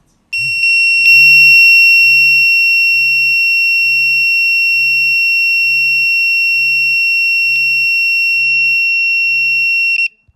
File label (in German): POCSAG Alarmton Abbruch